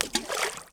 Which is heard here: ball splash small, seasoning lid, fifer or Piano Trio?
ball splash small